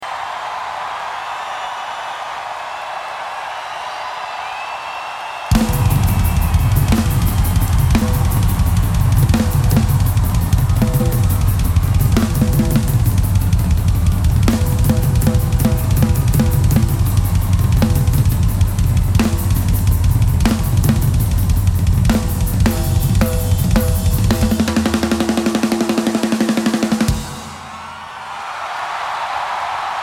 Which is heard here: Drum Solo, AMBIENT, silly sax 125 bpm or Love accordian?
Drum Solo